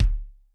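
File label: DRY KITS, dry kick; Crypton; DrumBase5000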